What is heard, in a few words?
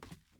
wood step; Teardown